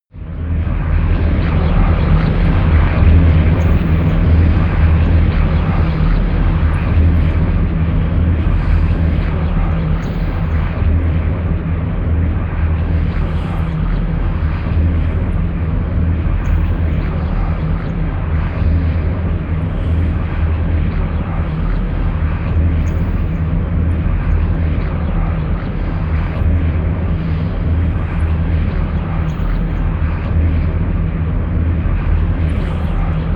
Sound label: drone storm high